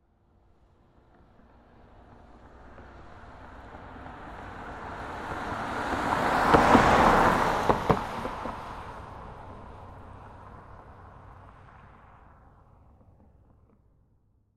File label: Car Pass By, Transportation, Automobile, Car, Pass, By, Car, Stereo; Blast Wave